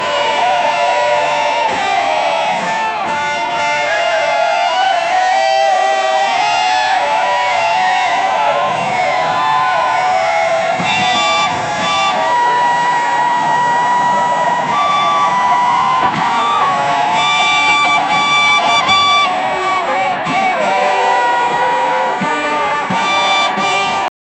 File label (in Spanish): en un concierto de rock